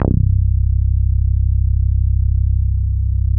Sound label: Synths BASS